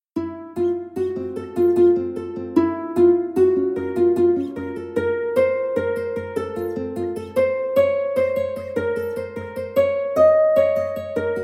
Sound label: ukulele